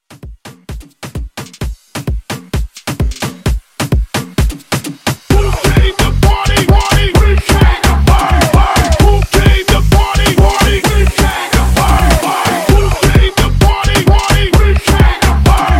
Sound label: Afro Club Hype Edit 130